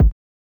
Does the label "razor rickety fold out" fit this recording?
No